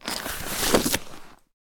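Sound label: inventory close; Original TK Sounds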